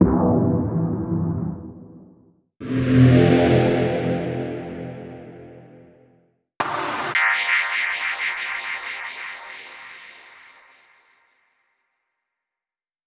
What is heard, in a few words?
melodic